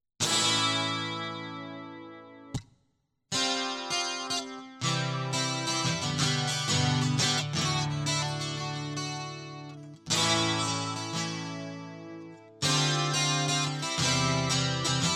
Guitar Loop

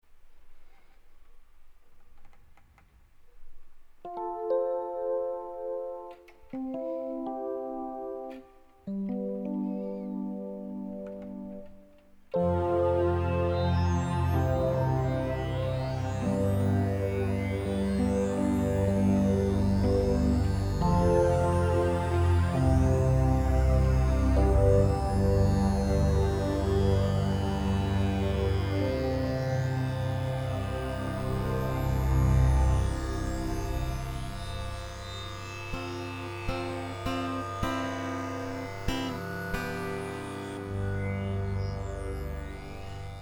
Reflecting Instrumental

